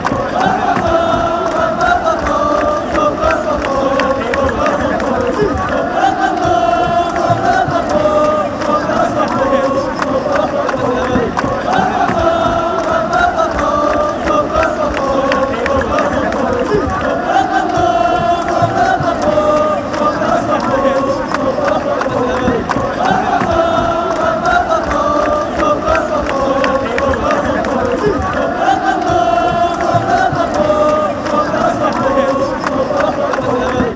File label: oh oh ohhh ohhhh, chants